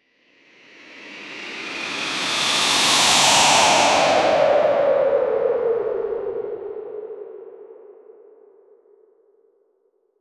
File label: flying through air